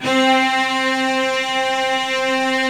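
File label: C3, major minor violin trill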